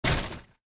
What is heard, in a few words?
scenario crates; Adventure Time - Break The Worm; ThrillGames